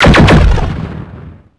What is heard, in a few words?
lrg pulse